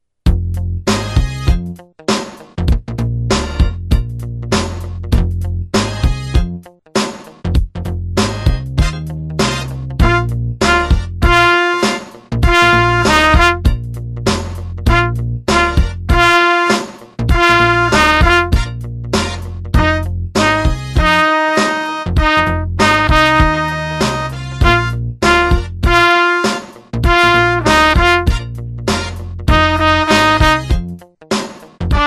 note shuffle

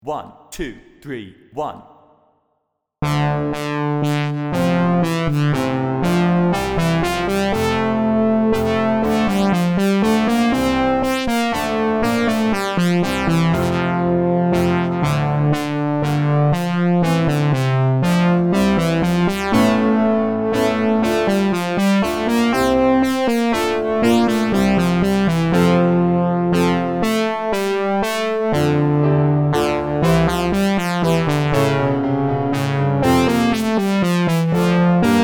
Basse Dance @120

